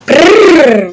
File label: brrrrrrrrrr